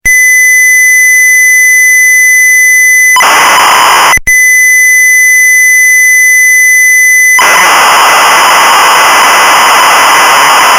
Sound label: turbo